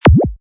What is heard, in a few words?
Wippa, Button sound; Multimedia; Button sound, wippa-3